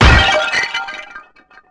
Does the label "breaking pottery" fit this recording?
Yes